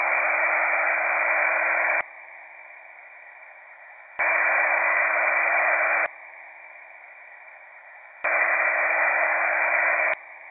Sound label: Alternating ambient; ionogram